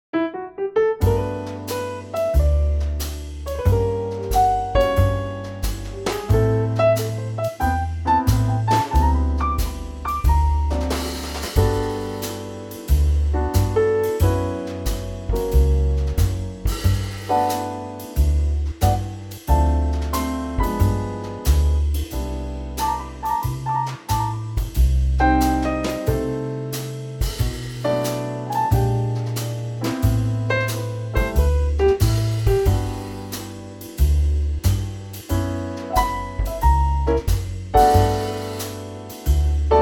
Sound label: G trio